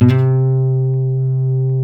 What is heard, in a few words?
C3 HANS NYLON, Guitars; Spectrasonics; Hans Zimmer Guitars VOL-1